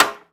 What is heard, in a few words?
Aircrafts MiG-15bis Ratchet; DCS